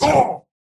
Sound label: pain big; Slork